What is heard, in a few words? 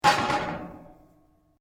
METAL ON METAL; Metal (Sound Effects:Materials)